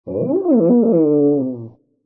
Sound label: TUBA COMEDY